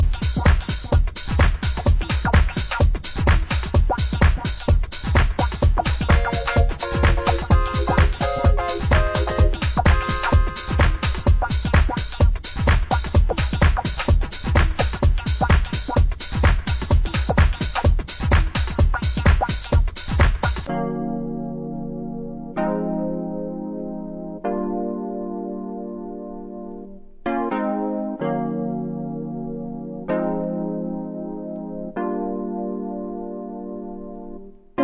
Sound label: instrumental mix